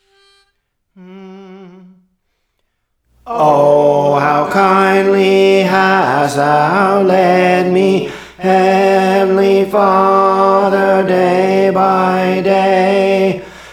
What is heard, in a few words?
Alto, Songs; Praise For The Lord Training